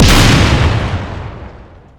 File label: Enemy Road mechanic Active Hit